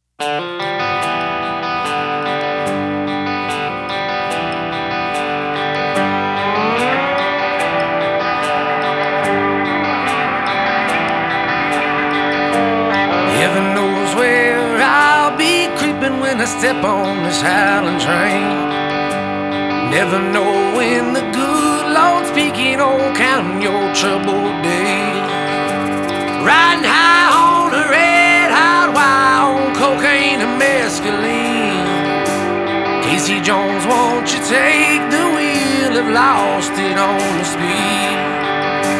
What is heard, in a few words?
Howling Train